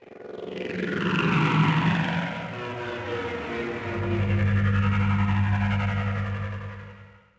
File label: pods distant; Star Wars Obi Wan; XBOX